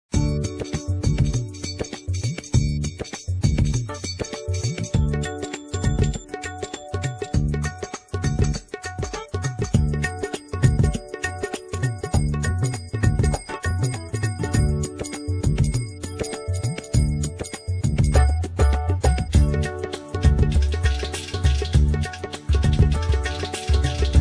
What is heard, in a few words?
india kit, korg; indish; pa80